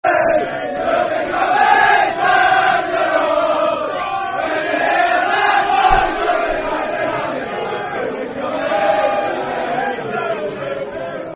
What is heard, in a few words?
We'll Be Coming, Football Chant (Soccer Song), N. Ireland